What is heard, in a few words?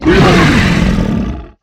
Harpooned; Evolve; Behemoth